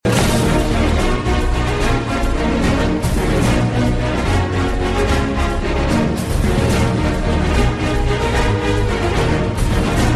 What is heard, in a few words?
run music; Captain jack sparrow